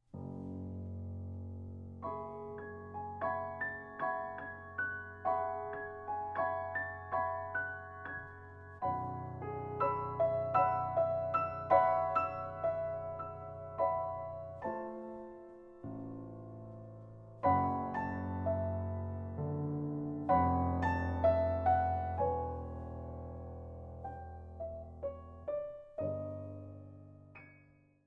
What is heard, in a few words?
Prelude Winter